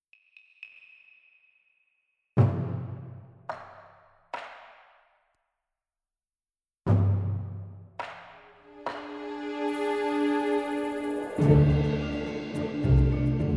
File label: Suspense